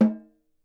SN, Percussion

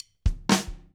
count fill, drum; Steve Gadd Drumscores

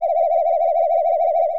sci fi; Masterbits - Switch FX

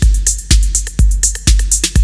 808 BEAT, breakbeats; Crypton - DrumBase5000

